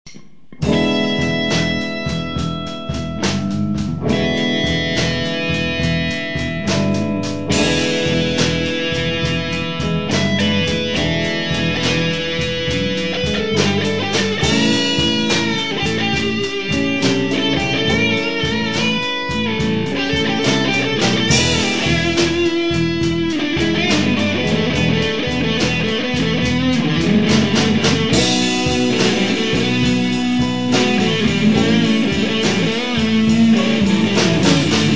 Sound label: Blues